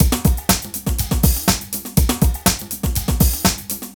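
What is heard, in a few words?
drum loops, right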